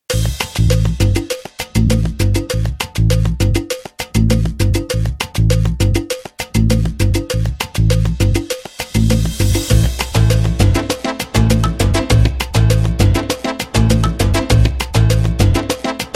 SALSA INTRO BPM 100

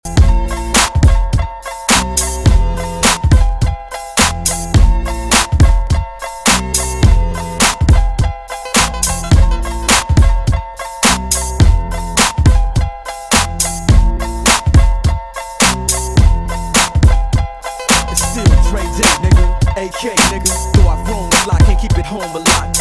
HIP HOP INTRO BPM 105

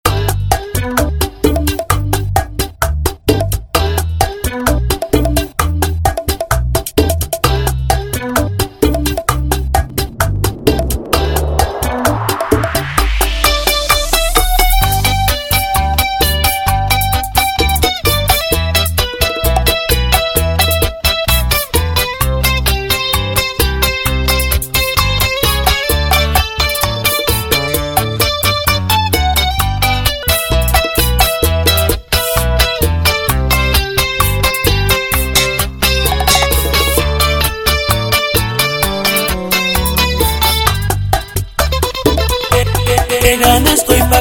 BACHATA INTRO BPM 130

